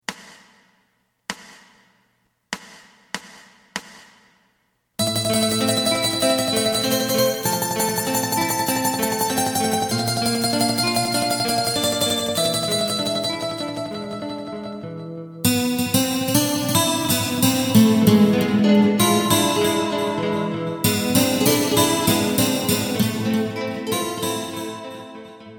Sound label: bass